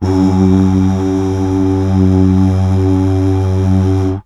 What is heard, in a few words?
UUH